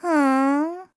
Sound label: Bored Hmm; Peatrice (bored): "Hmm?"; Skyward Sword; zelda